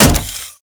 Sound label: Riot Moa Step Default, Footsteps; Warframe